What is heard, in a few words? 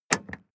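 sports car exterior bonnet open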